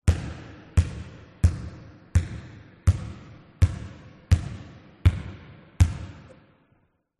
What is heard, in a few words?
Basket Ball Gym